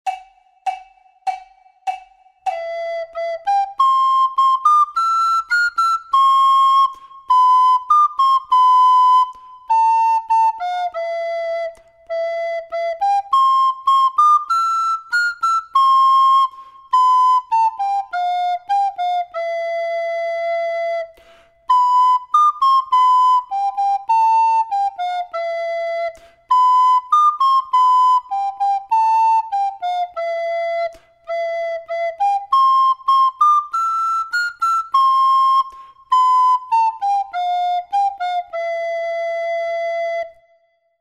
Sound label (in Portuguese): DUETO FLAUTA; LONG LONG AGO